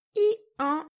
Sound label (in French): "hi-han"